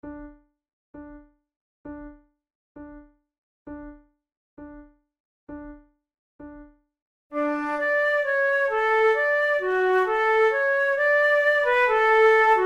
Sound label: duet, flute